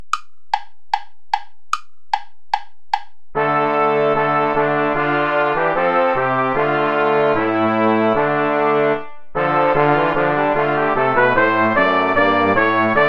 hinunter ist der sonne schein play along without trumpet; vulpius melchior